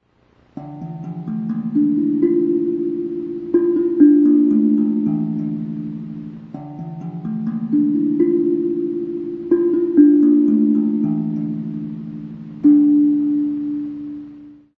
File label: dakebono, zen tambour